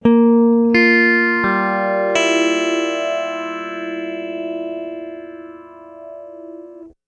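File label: clean guitar stereo polyphase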